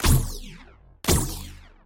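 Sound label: sci-fi blast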